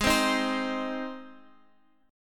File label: G sharp Major, Guitar, Open F; strummed; JGuitar